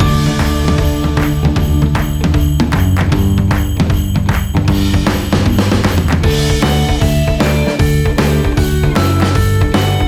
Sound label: Christmas Rock; Meow Motors